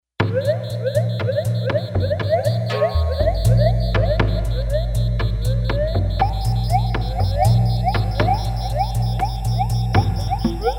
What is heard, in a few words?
ECO LOOP, synth